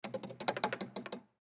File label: Go Kart Fill Gas, Go Kart Fill Gas Tank Top Off Shake Can; Go-Carts; SoundEffects-Blastwave; Sports